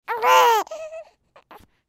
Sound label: Human Cry Whimper; Baby Newborn; Blastwave